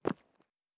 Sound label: Footstep Concrete Walking